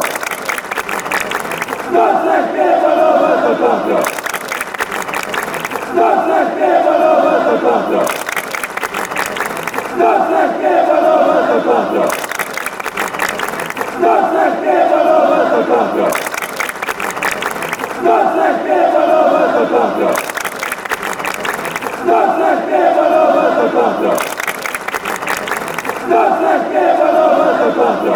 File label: fan chants